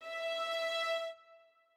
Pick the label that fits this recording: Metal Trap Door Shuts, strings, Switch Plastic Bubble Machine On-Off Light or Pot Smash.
strings